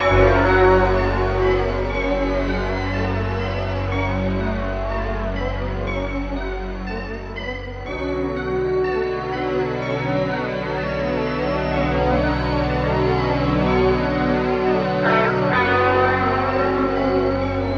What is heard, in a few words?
keys and guitar sad and moody; Loops